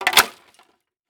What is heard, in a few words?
Impact Drop Plastic On Concrete Flat; Impacts